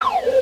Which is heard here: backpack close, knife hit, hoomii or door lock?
backpack close